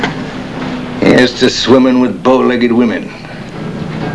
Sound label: swimmin, underwater; dod